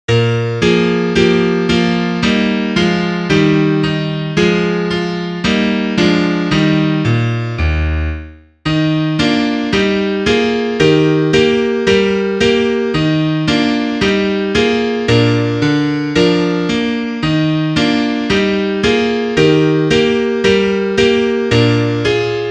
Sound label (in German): Klavier; brunn gefallen